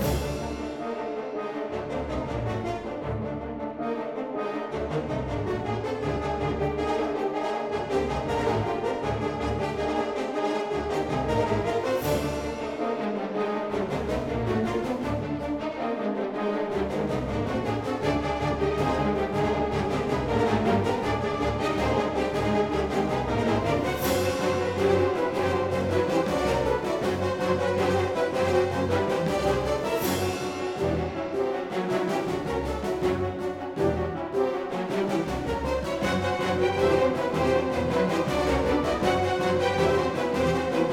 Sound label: Music Segments Fight Serious Theme Bpm160 Loop; asterix obelix slap them all 2